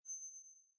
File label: shine; Domino